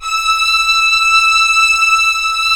STR VIO; AKAI S-Series CD-ROM Sound Library VOL-2

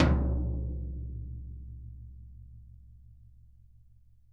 VINT KITS; Sonic Reality - Vintage Timetraveler

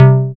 SYN ANA SYN, Dance Synth; Sound & Vision - Gigapack I CD 2 (Roland)

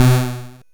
Drums, Hits; DCM8

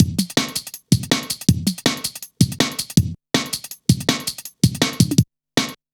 Tinkle, drum loop, jungle, 161.5; Zero-G Jungle Warfare 1, 2 & 3

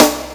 SD, Dance Drums; Best Service ProSamples vol.15; AKAI

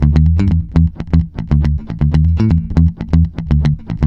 E, groover, bass; Spectrasonics; Bass Legends

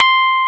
Keyboards Old School, C5; USB Soundscan vol.09; AKAI

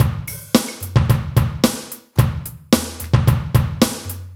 Rock Tweak, Funk Beats; Sonic Foundry (Sony Creative Software); Synchro Funk

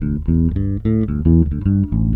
FREE 111 A, Bass Grooves; USB Soundscan vol.30; AKAI

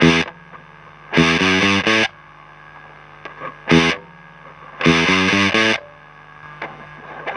HOT GTR, Roots Disco; USB Soundscan vol.32; AKAI

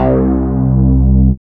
BAS, Eurotech; Sounds Good

